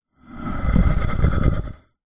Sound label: garden bird bath stone bath turn on base heavy scrape; household